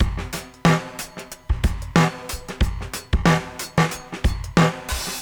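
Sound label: 92 bpm, beat loops; Zero-G; Monster Beats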